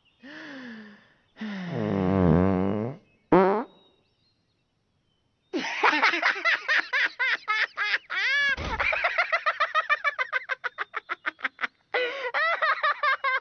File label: Fart Funny